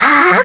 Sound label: Final Smash Pull; Zelda's Final Smash: Pulling back the Light Arrow (voice and sound effect)